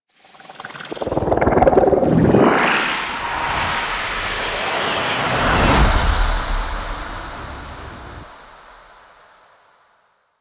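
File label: Transference Pulse; The War Within; Lair To Orbiter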